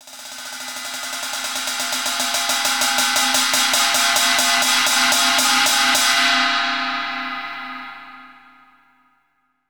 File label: ride